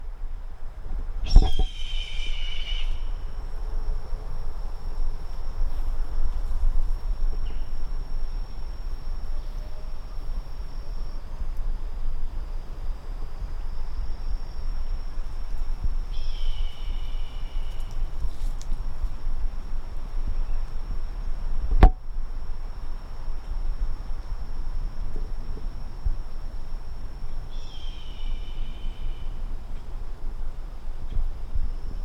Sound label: Bird Call; Sharon Woods